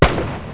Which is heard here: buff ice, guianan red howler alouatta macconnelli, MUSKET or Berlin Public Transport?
MUSKET